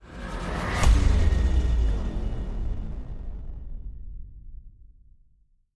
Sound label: Bass Drop; SoundsCrate